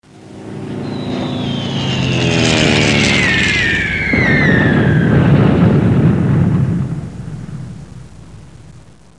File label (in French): Bombe qui tombe et explose; Explosions